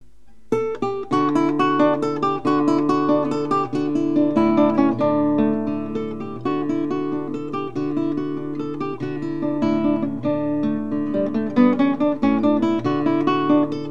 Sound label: Canario, guitar; Carlo Calvi